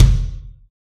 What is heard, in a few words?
ambient kick drum single shot e key; kick drum samples